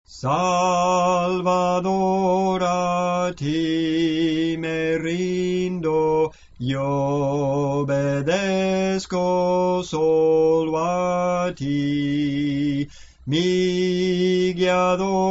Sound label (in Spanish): Soprano, Cantos Espirituales; Soprano (melodía)